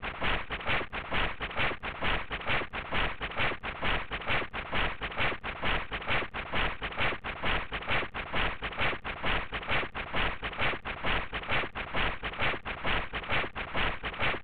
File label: heartbeat; doctor's office; staticky screen